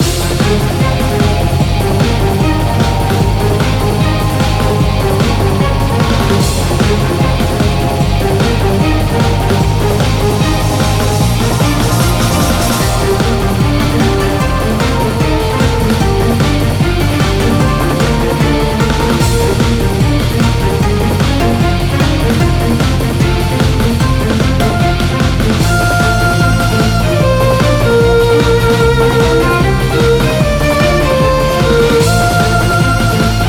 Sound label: battle